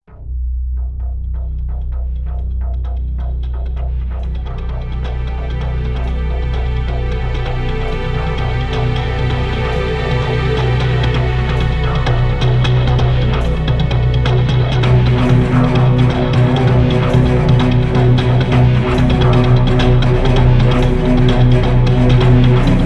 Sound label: caves desert drone; Call of Duty Mw2 2009; game